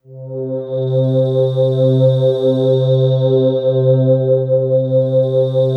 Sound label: pad, 160 BPM, Drum & Bass; Ueberschall; Drum & Bass Flash Trackz